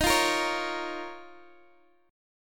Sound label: D sharp 7th Suspended 2nd, Guitar, Open D